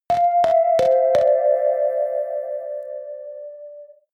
bell melody melancholic

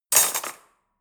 cutlery fork drawer place hard

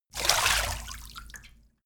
splash

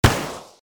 shot

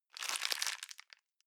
plastic bag small grab medium

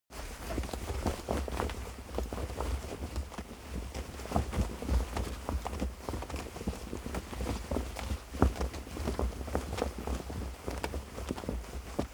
flag flap

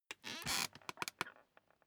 office chair creak sit up short

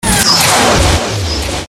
initial sweep

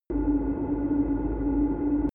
drone spaceship tonal

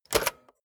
cassette player slide tray take out medium

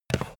stapler pickup

